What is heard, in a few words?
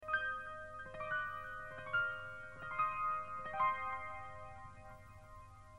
ringtone